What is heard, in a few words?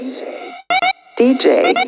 House Loops, Vocal Loops House 128BPM; Vengeance Samples